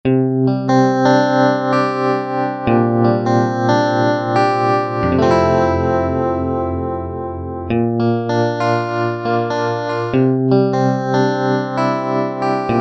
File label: Electric Guitar With Tremolo; synthesizer; Guess the synthesizer game